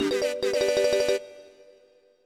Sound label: Success!; Banjo-Tooie